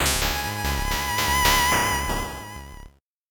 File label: 8bit jingle fast